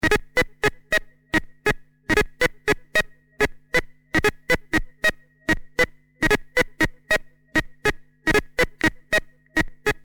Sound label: Solus Aggressive